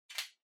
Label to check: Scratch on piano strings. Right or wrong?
Wrong